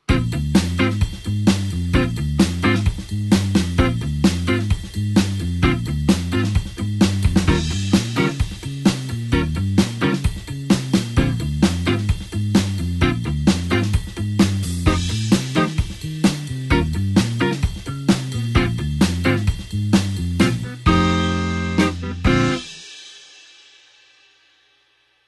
blues 8beat backing chord

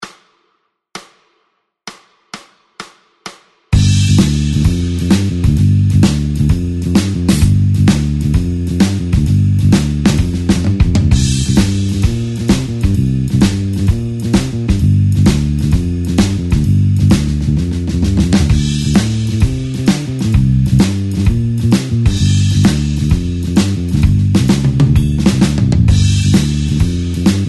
blues shuffle basic pattern G back

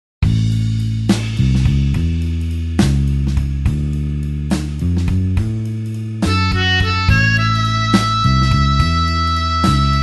blues harp slow A

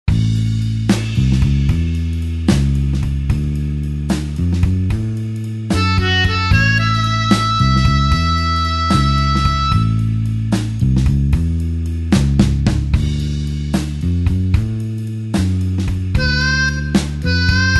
blues harp slow A tempo

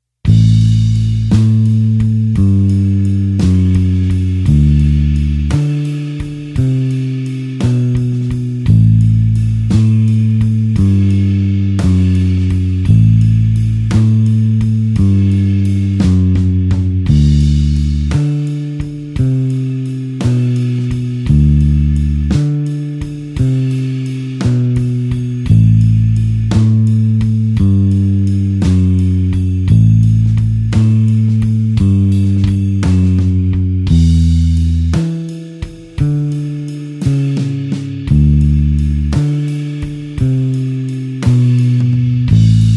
blues bassline slow A

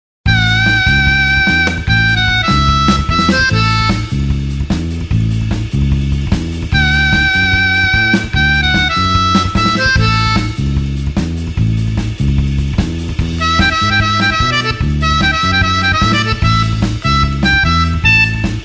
blues harp 8beat A

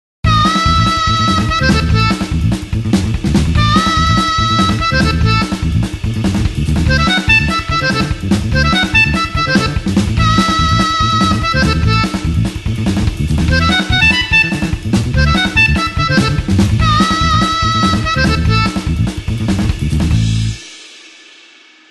blues harp 8beat A

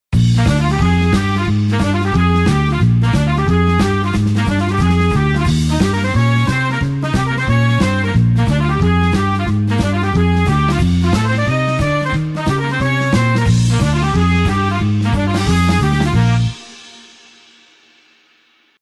blues horns up Bb

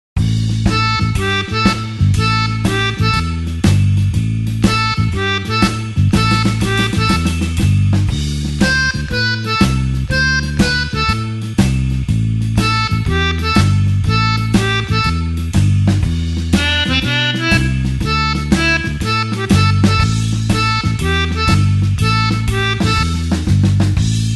blues harp shuffle A

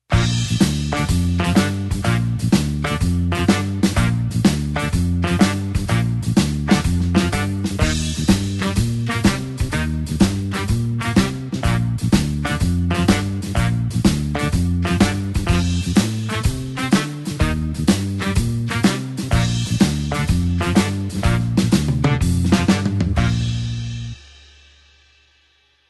blues horns shuffle Bb

